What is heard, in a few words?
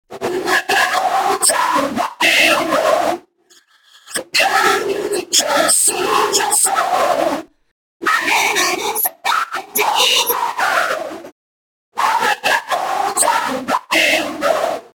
Vocal Noise